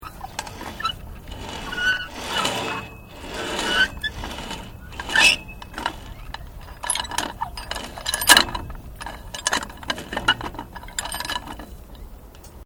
Flying fox General Travel Pully Sounds, Playground equipment; Sports